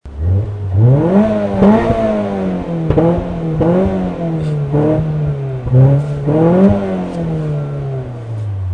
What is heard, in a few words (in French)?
ralenti micro a l avant flammes